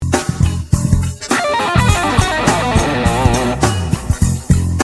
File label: Lick